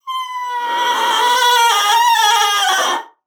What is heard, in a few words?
BASSOON; Xsample VOL-6